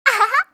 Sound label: "ahaha"; kanjou